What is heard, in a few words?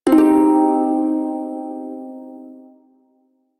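Prime Shawzin Pentatonic Minor Chord L; Music; Warframe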